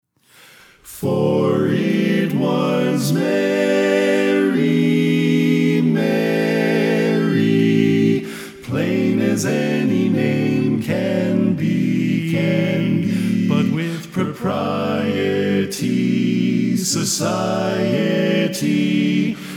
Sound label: Bass Left